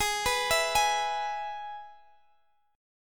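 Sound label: Ab Minor Sharp 5th chord, Guitar, Open D tuning; x,x,18,17,19,18; down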